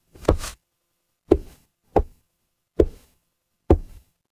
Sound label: footsteps wood